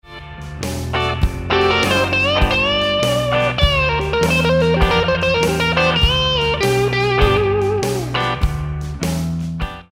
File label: Reconsider Lick, guitar